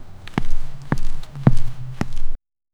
walking sound effect, boots

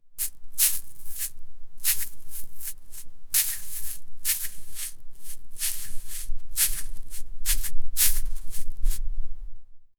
a gently single tap, beach

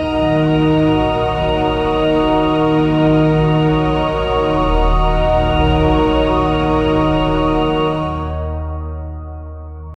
cold cinematic melancholi, melancholy

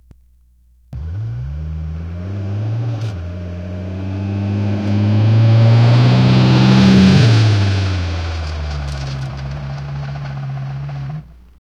off road truck accelerati

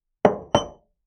put glass on glass table

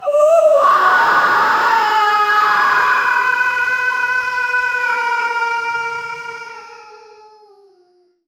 man mentally maniac, breakdown

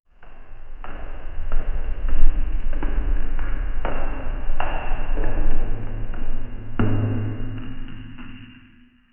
ambient soundscape eerie, abandoned house

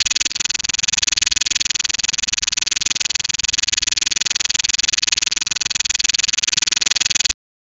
create a logo, trendy

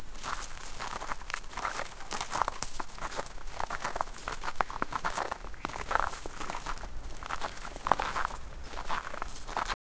walking deer in nature

